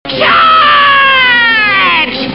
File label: charge